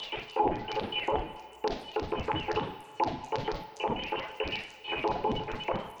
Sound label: Tonal Re Draw, sparse soundscape